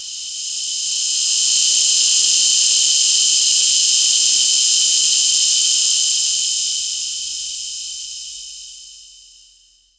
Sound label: frost breath cold gusts, compressed air